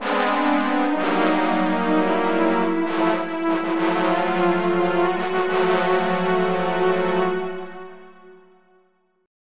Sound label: DISASTER; MPG-Net (USA); empire